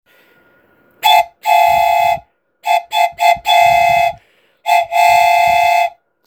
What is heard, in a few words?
choochoo